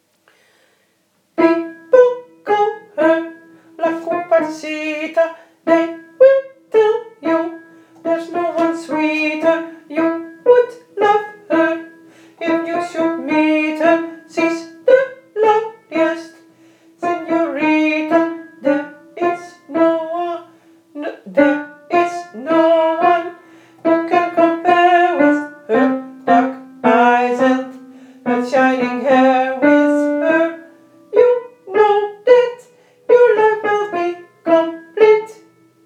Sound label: La cumparsita mezzo